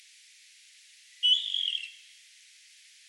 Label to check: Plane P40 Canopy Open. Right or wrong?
Wrong